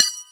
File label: Bells